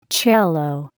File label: cello